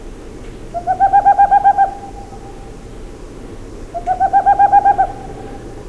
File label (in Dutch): Ruigpootuil Aegolius funereus; vogels niet vechtstreek